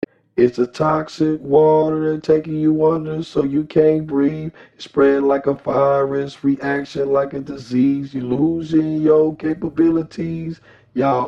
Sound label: drumnbass, 163, G sharp, Acapellas